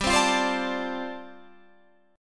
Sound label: G 7th Suspended 2nd Suspended 4th G chord